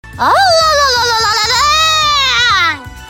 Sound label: boo